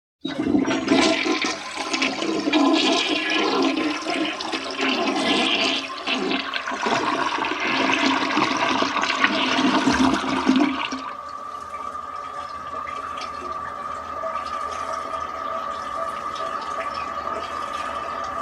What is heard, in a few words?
toilet flushing